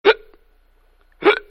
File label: Hiiiiiiiicupps